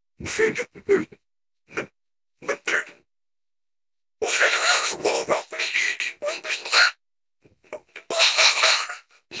laugh male; fnaf

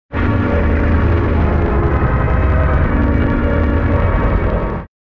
scary; filmbox hd

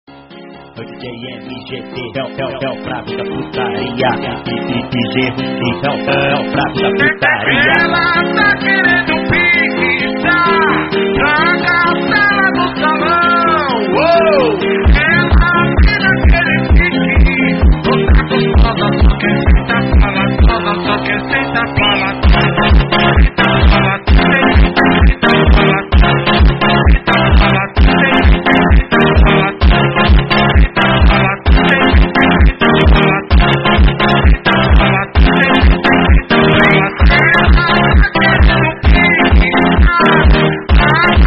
phonk; squid games

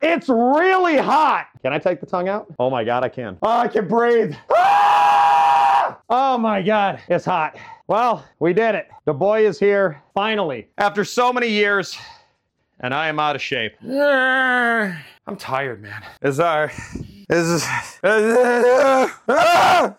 aaaa; corgi fursuit

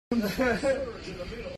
haha

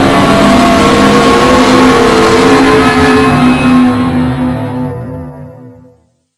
jumpscare sound

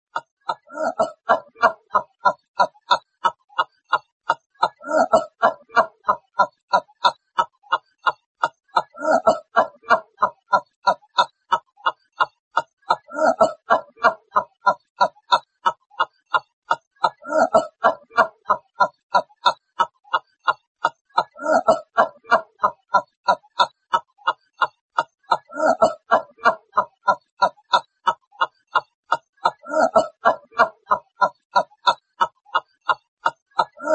laugh; mcfly

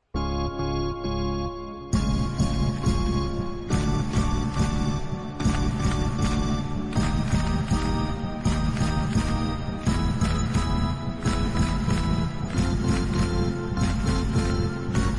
piano

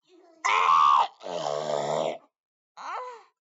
arrrrrrrrrrra eeeeeeeeeeer ahhhh

